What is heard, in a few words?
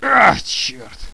alpha pain; paranoia